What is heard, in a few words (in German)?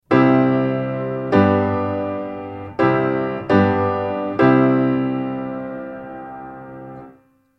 akkordfolgen